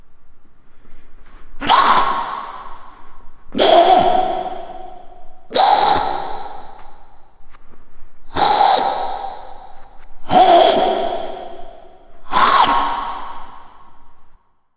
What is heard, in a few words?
Ghoul